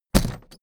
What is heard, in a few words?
suitcase put down hard